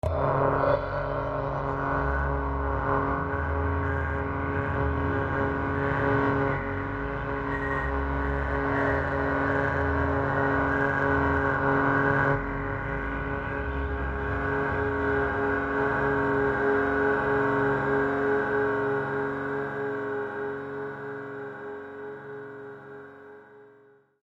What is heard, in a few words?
Scanner SciFi Technology, Scanner Data Transmission Hum Distortion; Sound Design